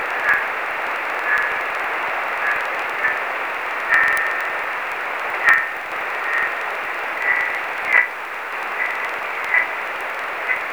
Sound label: 6k, High Speed CW Meteor Scatter; K2TXB at N0UK